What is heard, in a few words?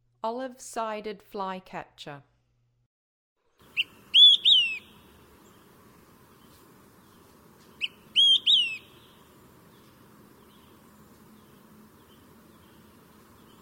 Olive-Sided Flycatcher, Songs of Birds of North America; Western USA; Western USA Songbirds